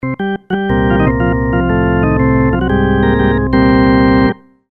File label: Beat Organ